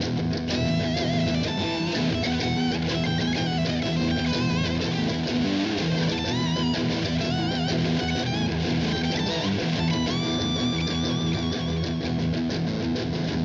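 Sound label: just guitar loop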